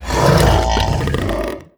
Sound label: idle in, barnacle; Half Life Alyx